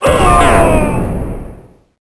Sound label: Sfx Swirling Horror